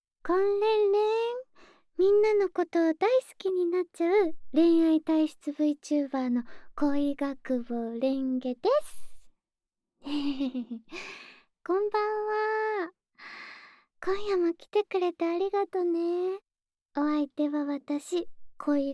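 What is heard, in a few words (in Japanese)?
【ASMR】【ヘアカット&メイク道具くすぐり】; れんげがオシャレにしてあげる♪; VTuberに転声してみた 〜安済知佳→恋ヶ窪れんげ〜【好き好き・シャンプー・耳吹き】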